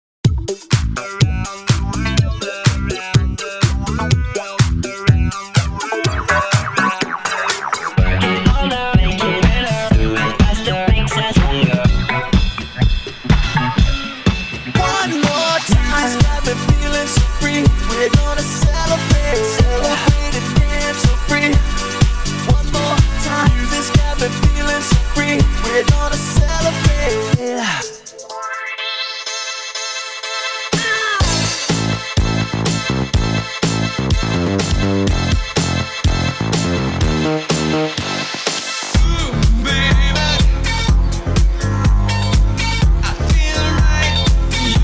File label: French House Mix